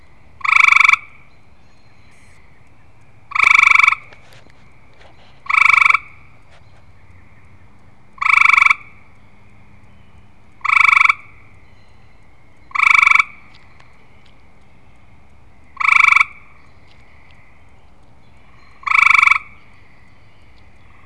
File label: gray treefrog